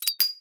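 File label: Bullet shell Shell Eject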